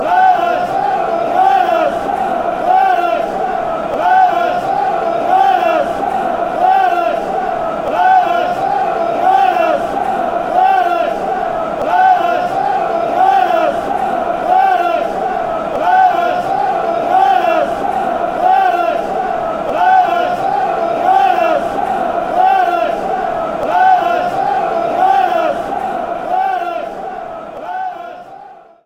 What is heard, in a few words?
haway lads fast, fanchants; nufc